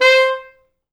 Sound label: C4, Pop Brass; Best Service ProSamples vol.19; AKAI